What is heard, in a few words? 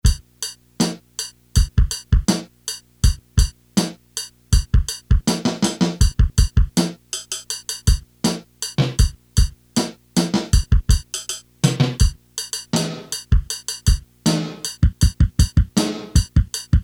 Multi-Split Percussion, Casio CT-370; Tones